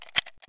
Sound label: mosin round load; Escape from Tarkov